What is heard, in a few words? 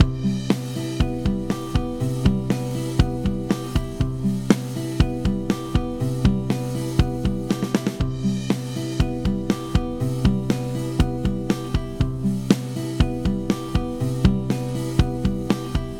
waves comp ratio max out down